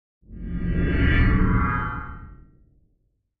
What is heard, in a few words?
Space Ship Cloak On; spaceship fx